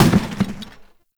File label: Janitor Cart Impact Short; Warframe; Ambience; NineteenNinetyNine